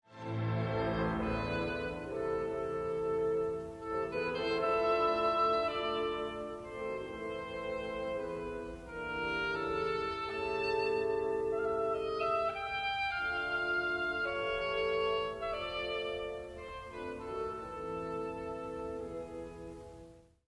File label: Schumann Piano Concerto